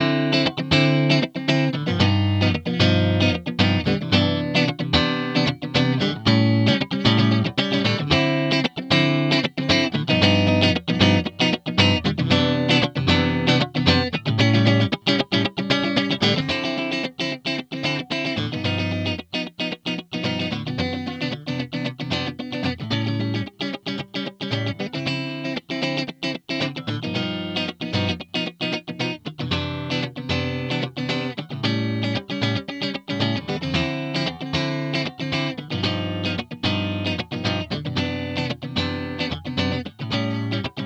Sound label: autopan